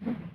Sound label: Imp Attack Whoosh, imp fireball; Doom